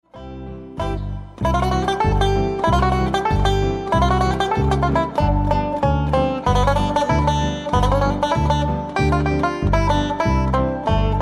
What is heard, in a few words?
RINKY DINK RAG